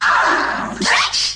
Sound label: 1980s rap scratch hit; Scratching and Turntablism; DRUM LOOPS and BREAKS